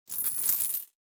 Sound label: deposit credited